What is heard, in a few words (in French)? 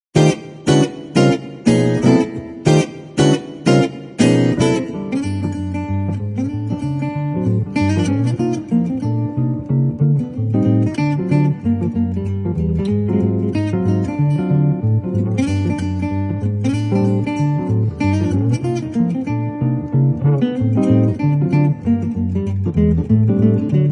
douce ambience